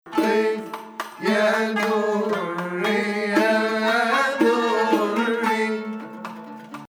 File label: Hijazkar Octave